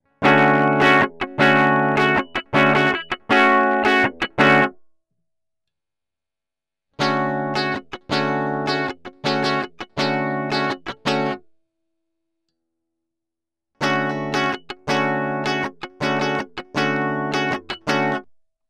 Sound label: cutting